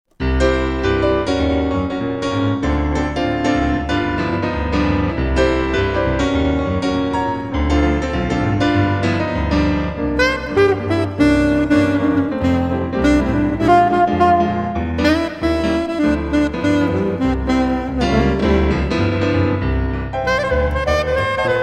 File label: sax